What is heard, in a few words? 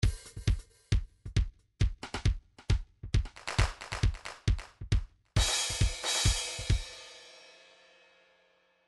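trance midi loops beats delay; newtronic trance constuction kit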